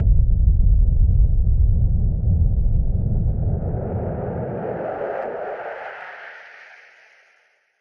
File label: Noise Bomb Boom Filter; musicradar; bomb factory